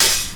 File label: 2000s Dampened Open Hi Hat D# Key, Royality free open hat one shot tuned to the D# note